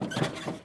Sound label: Land Soft; Test Drive Off-Road 3